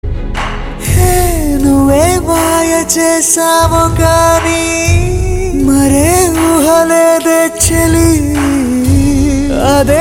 Telugu

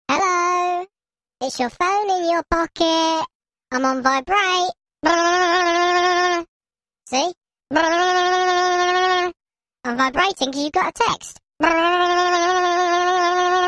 Vibrating Phone

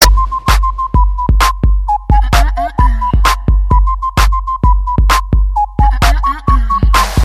Goa Theme Sms

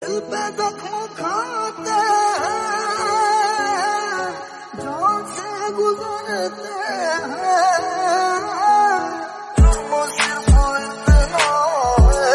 Hindi

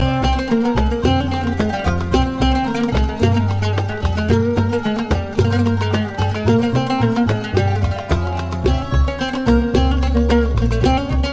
Oud

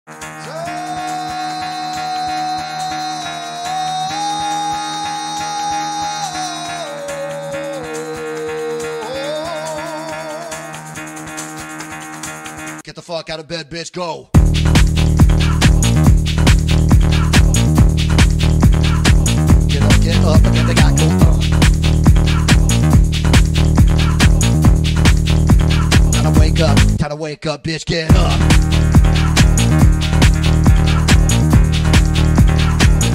instrumental Ringtone

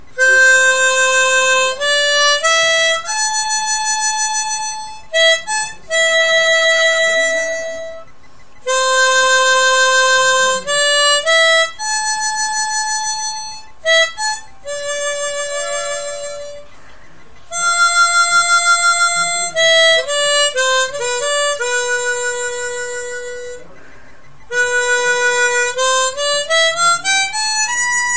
mouth organ ringtone; Sholay

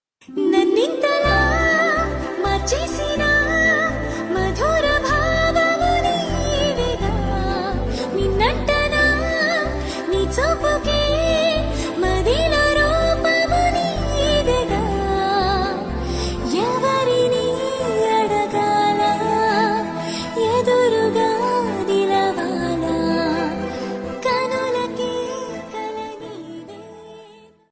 Serial Song; Sasirekha Parinayam

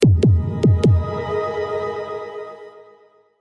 Pulse Sms

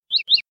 Two Fast Chirps

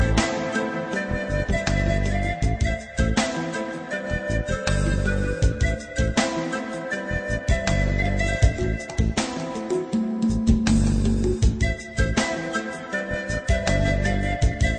Peru Flute

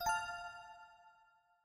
Cool Notify

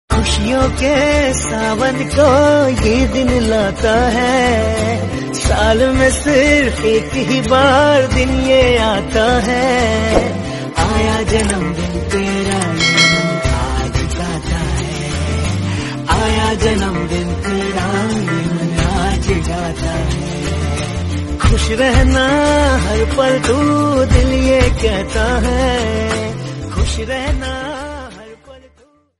happy birthday song happy birthday ringtone; hyderabad

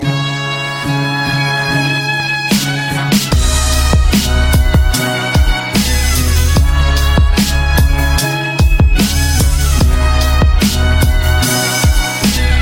Trumpet Hip Hop

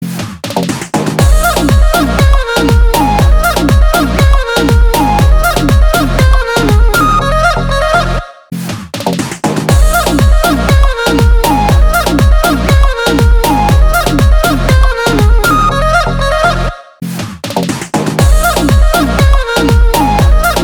instrumental bgm Ringtone; Bhool Bhulaiyaa 2

